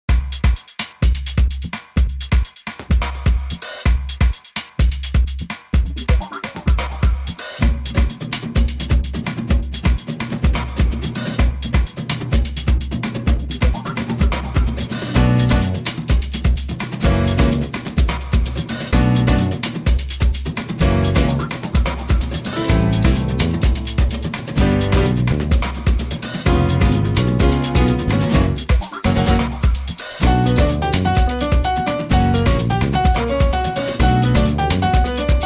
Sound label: Samba